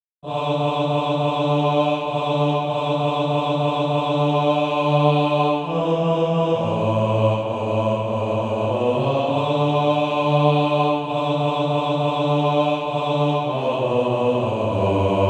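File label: bass, Practise Music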